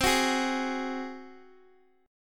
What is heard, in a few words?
G sharp 7th C